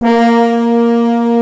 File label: fhorn Bb3; Hexen Demo; Hexen Music; Hexen 6vox